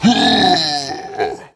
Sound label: half ogre male hit; DAoC